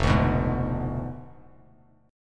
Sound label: F Diminished 7th F down Bass Standard; Fdim7 strummed; JGuitar